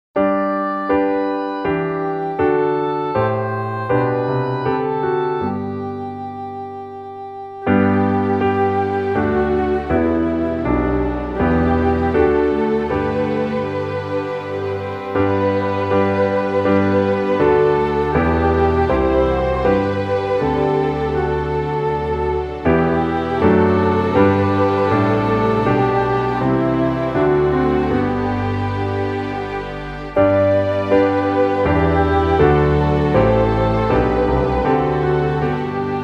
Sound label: Doxology instrumental